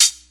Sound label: Dark Tone Hat Sound Sample D Key, hat sample tuned to the D note; hat samples